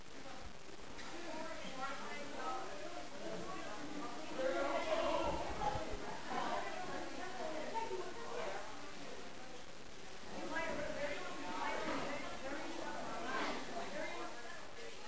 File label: CROWD